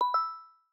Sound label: state change confirm up; Signal Desktop